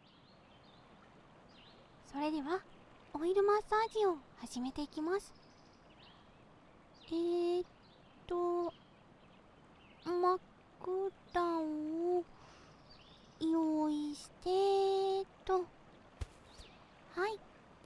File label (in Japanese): 医療用シリコン耳でオイル耳マッサージ